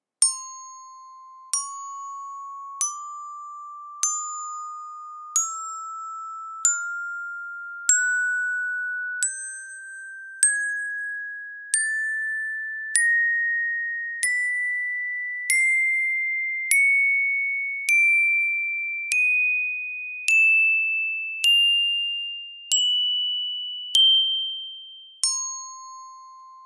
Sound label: 20 tones Chromatic Glockenspiel with plastic clubs; auris; アウリスグロッケン